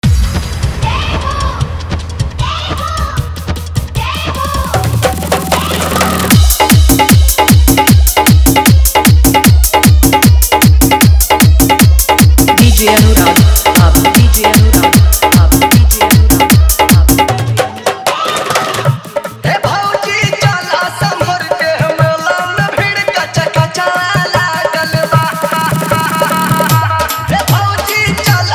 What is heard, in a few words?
Fully Dhollki Jhankar Bass Dance Mix